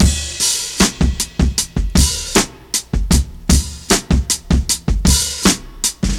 74 bpm breakbeat sample D key, Free drum loop - kick tuned to the D note. Loudest frequency: 3148Hz